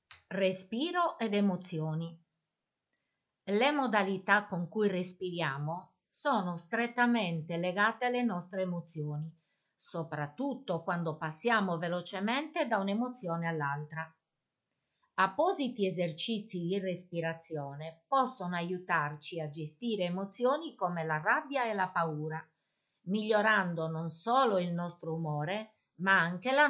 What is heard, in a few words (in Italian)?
Respiro ed emozioni